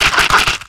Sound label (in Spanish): Grito de Corphish